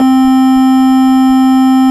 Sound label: ORG Farfisa Clarinet C 4